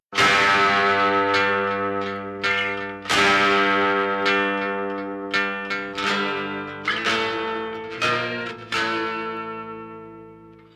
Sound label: Guitar Experiments